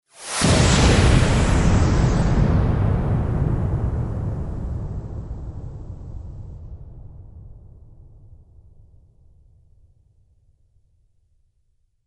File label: explosion